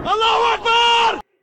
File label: detonate; insurgency; allahuakbar